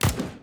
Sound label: shoot; chicken gun